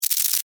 Insect Move Shake, Movement, Bug; Animal; BlastWave

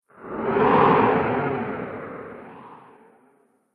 Gust Winter Planet; Sci Fi

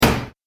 Arcade Game Bang; video game; retro inspired; Arcade Game Sound Effect; Think Nintendo, Atari, C64, Etc.

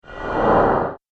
Industrial Swoop Sound, Whoosh; Whoosh Sound Effects; sound design

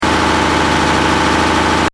Engine: High Revs Loop, car; Diesel; Cars; Vehicles; Stuart Duffield

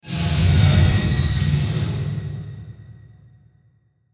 Whoosh Filter, Whoosh Filter Metallic Reverb; Blastwave; Whoosh Sound Effects

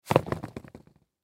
Tennis Shoes Down, Tennis Shoes Set Down Cement; Sports; Blastwave; Foley

